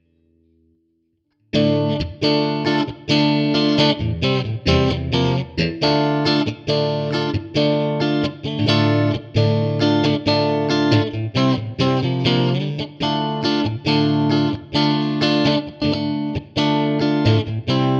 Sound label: Clean Middle Bridge - Fender Bassman 4x10, pickups